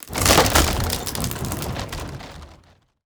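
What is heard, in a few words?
siege death; Onager, Ballista, Ram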